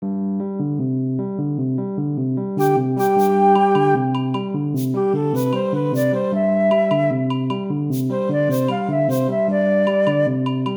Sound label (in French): melodie